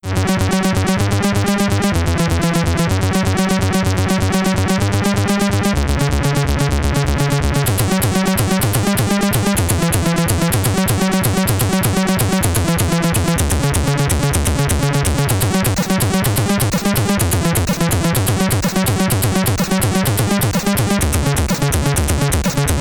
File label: SID Stereo, commodore 64; Hawkeye; Level 2 Waterfalls, Level 9 The City